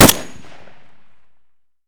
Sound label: m1a fire close; Escape from Tarkov